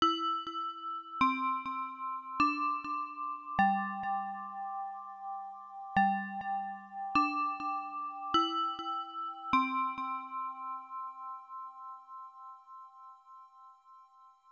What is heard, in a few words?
Chime; Doukyusei 2; FM Towns